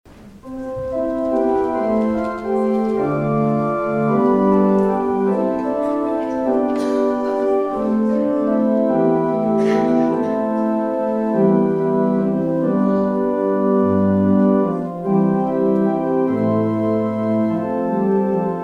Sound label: Hymn; Morning has Broken